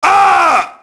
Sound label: merc death; enemy; Max Payne